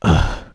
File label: uhhh; Wired for Sound Pro CD; Aristosoft, Inc.